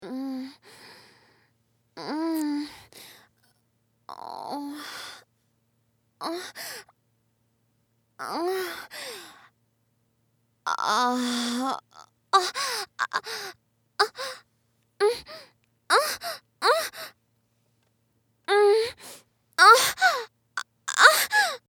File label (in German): Orgasmus